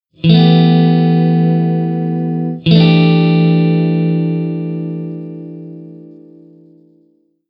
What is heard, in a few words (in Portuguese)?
Campo Maior Acordes Em11 Em711